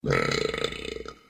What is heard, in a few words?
BURP